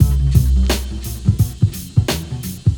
jazzy wazzy, funky drum loops

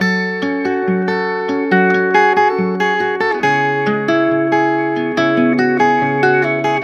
guitar

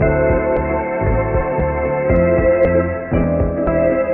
strings; Phonky

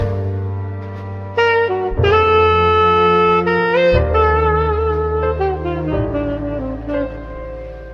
sax, sad; loops

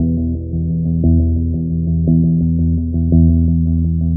bass, Loops

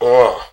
uh

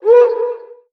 "woo"

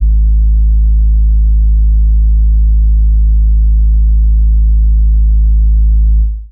hummer; Heartbeats

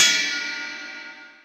Cymbals

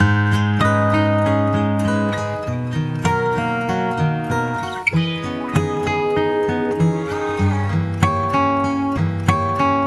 guitar smart plan

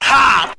"ha", Voice

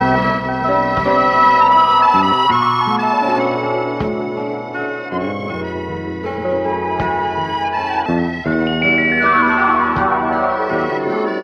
keys serenada, Phonky loops

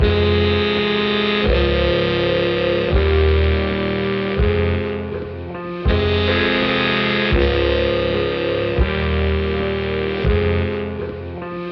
keys, Sad

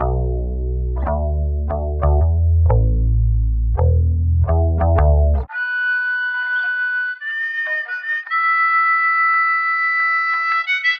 keys old western, Phonky loops

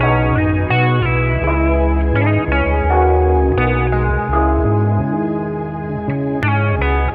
guitar, Loops, Phonky

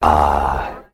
"ah"

